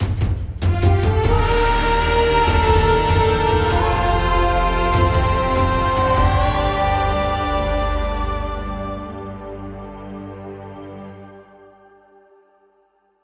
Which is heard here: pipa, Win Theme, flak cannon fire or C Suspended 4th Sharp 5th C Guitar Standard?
Win Theme